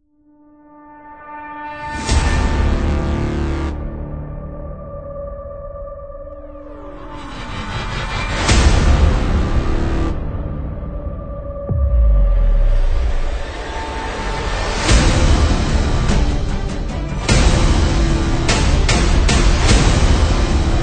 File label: Trailer